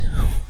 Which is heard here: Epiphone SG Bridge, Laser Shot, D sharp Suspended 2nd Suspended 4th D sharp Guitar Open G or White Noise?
Laser Shot